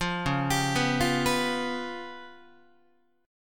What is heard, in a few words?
Db 7th Flat 5th Guitar, Db7b5 chord; Db7b5 arpeggiated; JGuitar